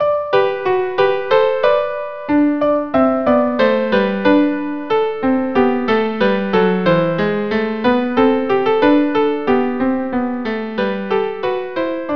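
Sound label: viola viola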